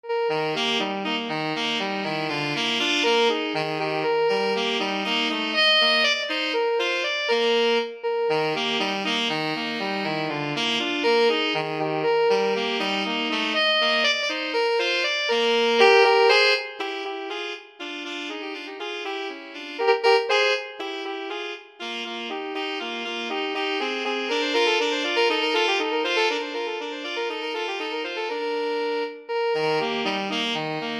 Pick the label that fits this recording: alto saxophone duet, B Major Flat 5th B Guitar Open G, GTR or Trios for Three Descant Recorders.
alto saxophone duet